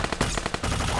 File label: fire